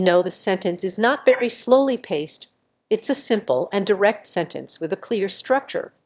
Babble, noise

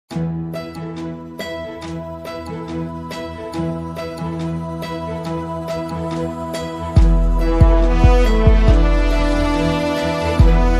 Dhun; 108 Shatrunjay Naam Dhun; jainsong

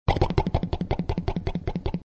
truck start; jelly truck

